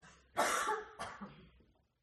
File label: HUMAN VOCAL Female Cough; smartsound